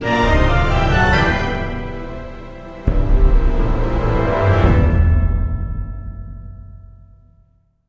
Tense Sting; Jurassic Park The Game

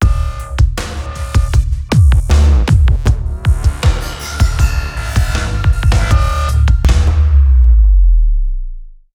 Monkey Bomb Song; Gorod Krovi

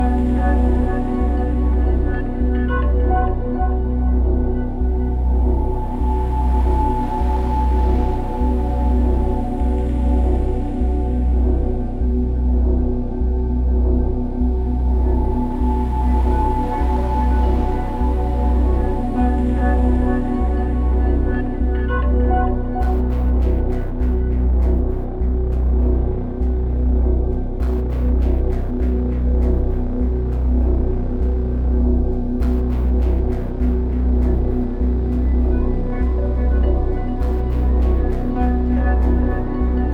Darkest Loop; Face It - A Game to Fight Inner Demons

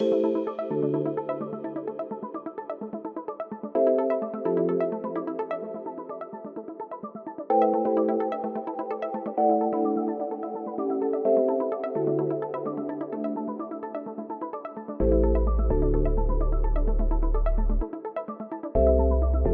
time travel theme travel loop

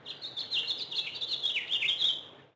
Intro Street; the princess and the frog; Restaurant; Street Bird

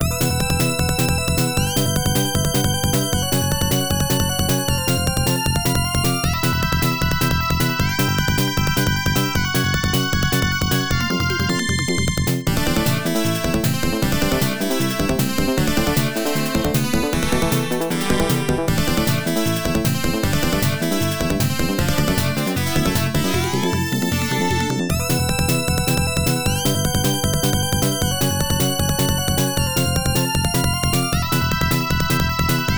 Unused BGM

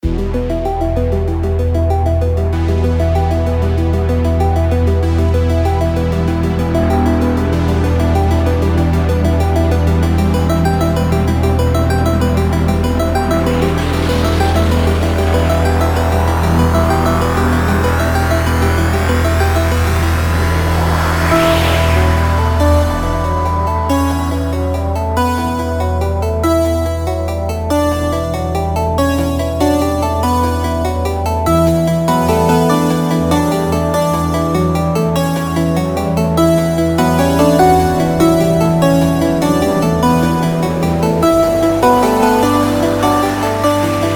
bgm opening; Robo's World The Zarnok Fortress

